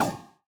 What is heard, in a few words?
ball bounce cold; CrossCode; battle